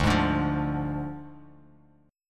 D Diminished D, Bass Standard; strummed

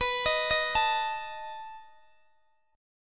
B 7th B down Guitar DADGAD

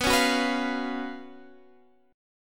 F sharp Major 7th Suspended 4th, B, chord

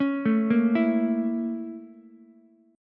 Bb Minor Major 7th Flat 5th Bb, Ukulele; BbmM7b5; arpeggiated; 6,9,6,7; JGuitar

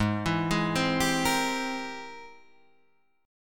Ab Augmented Major 9th Ab Guitar Open D

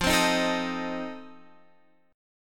F 7th F down; strummed

